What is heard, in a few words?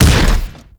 Shotgun Fire Shot; Kuva Sobek; Double Barrel Shotgun